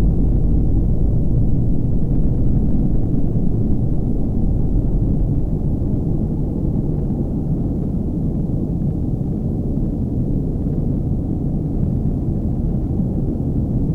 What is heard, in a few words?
Ambiance; Knytt